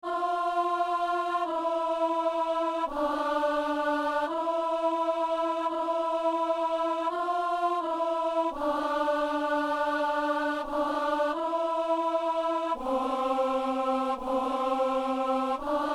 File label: alto; O bone Jesu; Messe du 17 janvier à Saint-Denis